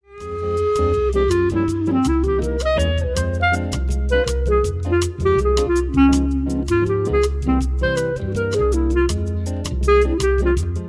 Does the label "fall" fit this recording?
No